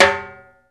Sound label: RIMSHOT, Timbale; Timbale Battery